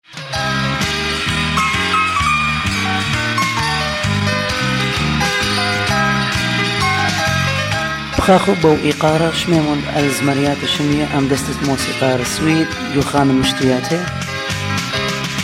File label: band intro